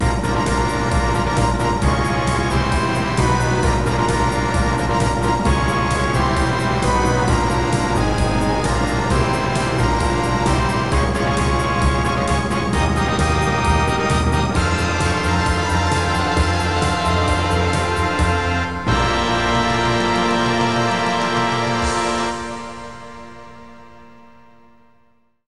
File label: gala fanfare